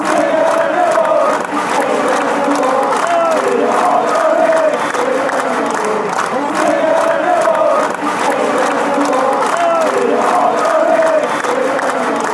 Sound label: victory ours, chants